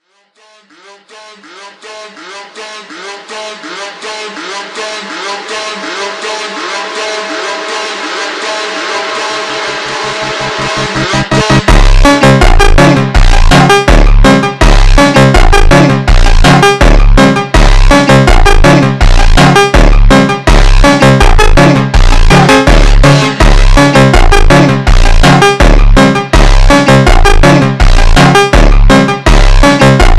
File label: MONTAGEM Ultra Slowed